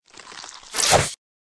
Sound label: mantis attack, monster